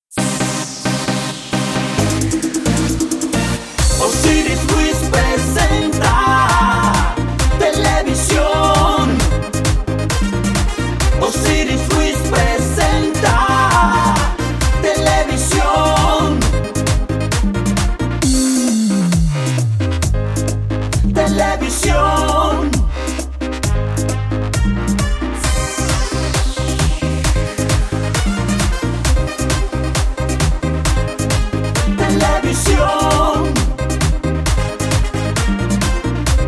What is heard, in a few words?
TV Jingle Latino